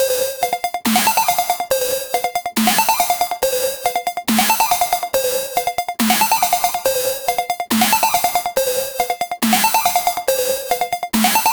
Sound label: cyberpunk beat 140bpm